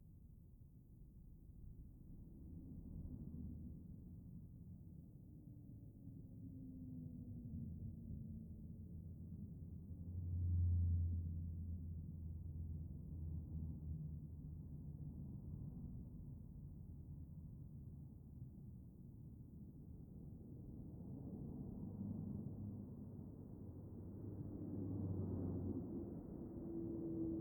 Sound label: birds; Lettre du Voyageur a son Retour